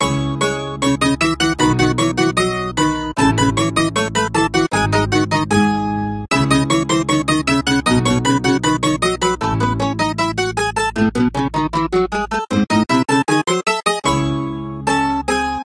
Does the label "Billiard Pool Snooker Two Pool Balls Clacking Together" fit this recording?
No